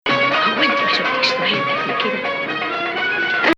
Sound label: Bayati Octave